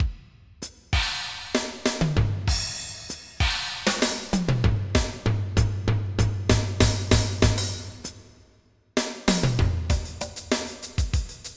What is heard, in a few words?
drums; Hot Skins